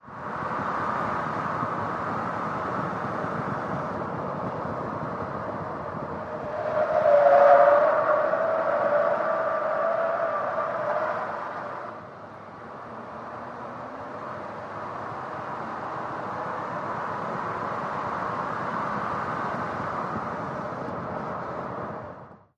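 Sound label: SUV Truck Accel Corners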